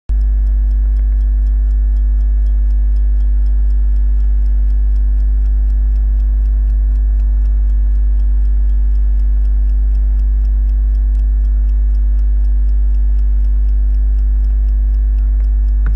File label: watch sound